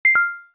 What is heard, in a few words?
cam stop